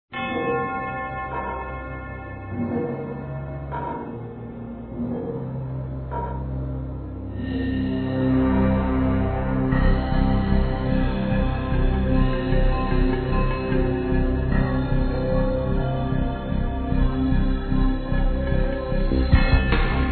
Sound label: dun, dungeon music